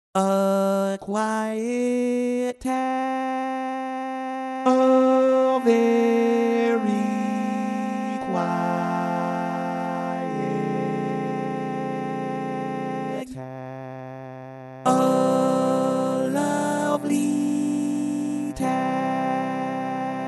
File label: Quiet Tag Tenor, barbershop